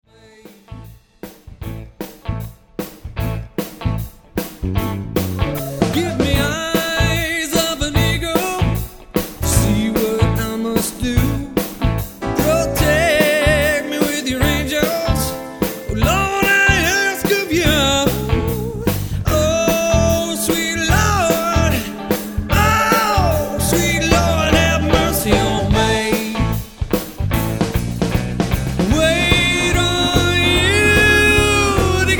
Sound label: eagle song